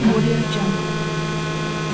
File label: robotic mechanism